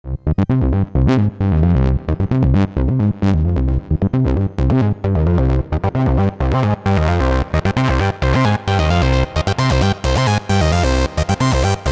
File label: midibox sid